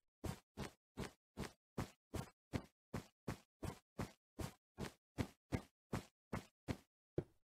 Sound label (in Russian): shagi po snegu, zvuki hodbi; minecraft